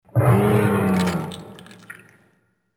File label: Moose; SFX; GGJ 2022